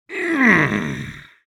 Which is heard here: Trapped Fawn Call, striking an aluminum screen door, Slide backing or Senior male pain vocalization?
Senior male pain vocalization